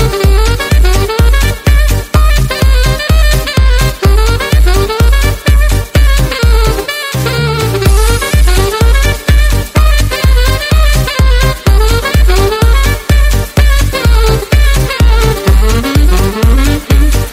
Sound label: saxophone mix